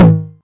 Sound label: Tom Hi, Novation Drumstation 909